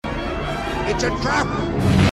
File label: trap